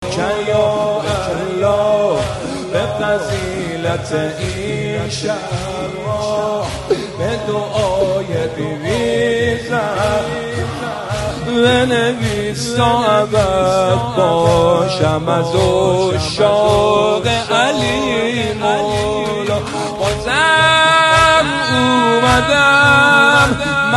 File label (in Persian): مداحی